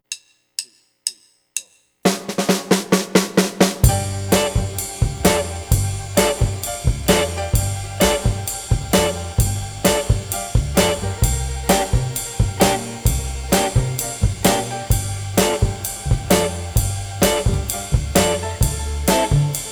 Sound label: Funky in G, Blues; harp